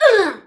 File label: pain; Alien Arena